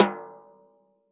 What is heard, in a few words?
natural sd rim off, snare; single hits